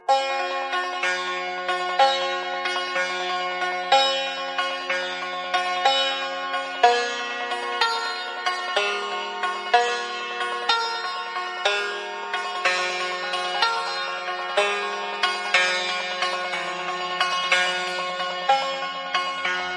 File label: mahoor; saba